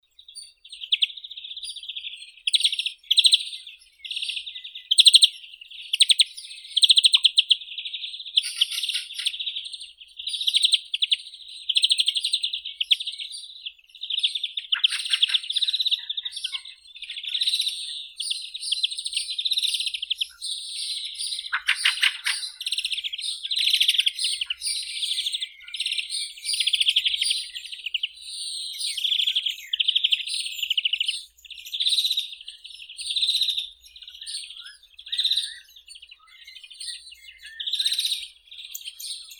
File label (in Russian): zelenushka obiknovennaya chloris chloris